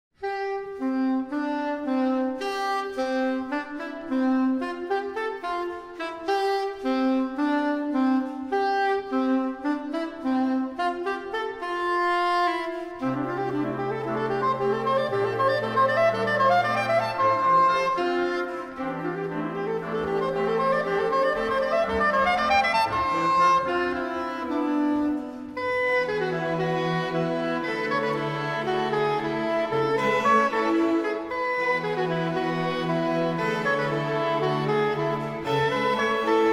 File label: Concerto for soprano saxophone; LNSO; Malcys